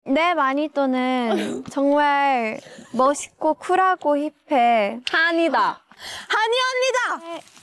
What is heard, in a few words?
vocals